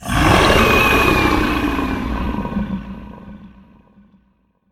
Roar; Yharon

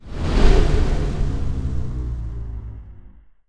Brimstone Monster Spawn

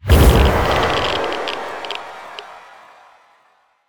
Death; Nuclear Terror

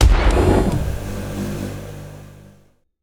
Twins Eject

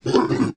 Zombie

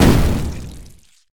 Plague Boom

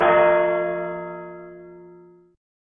hammer Big Impact

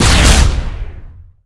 Dead Sun Explosion

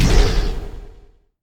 Brimstone Gigablast Impact

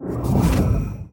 Chaos State Over

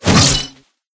Meaty Slash

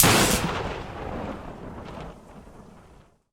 Lightning Aura

